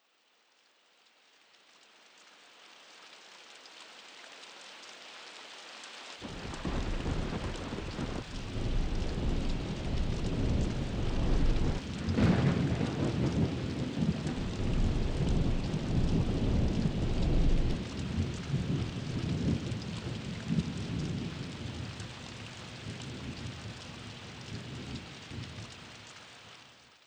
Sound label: thunder